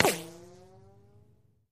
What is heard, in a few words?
Bullet Ricochet Sin